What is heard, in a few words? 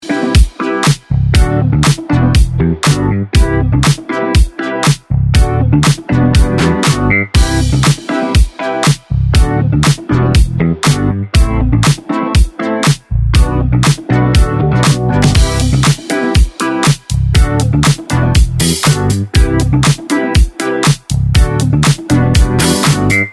music love love loop